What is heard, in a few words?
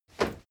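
step crowd run loud single right, footsteps; schritte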